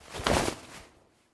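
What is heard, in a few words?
Ara Parrot move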